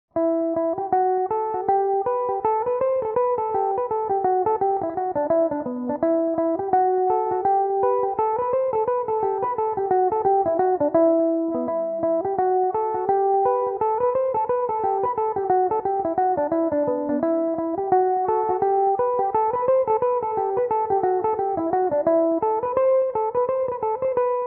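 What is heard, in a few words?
E minor, Irish mandolin; The Golden Stud; Maurice Lennon; Belmuse EM-190 electric mandolin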